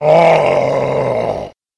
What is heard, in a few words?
monster barbarian axeman